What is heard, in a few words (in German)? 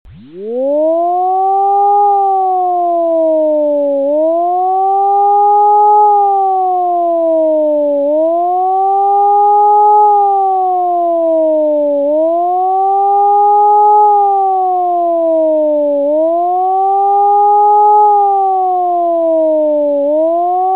warnung; stadt muenster; feuerwehr